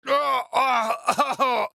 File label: AHurt